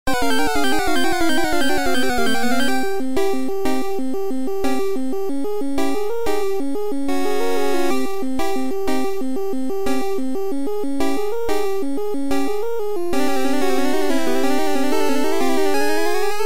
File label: battle wild pokemon; Pokemon Red Blue; gamesoundopedia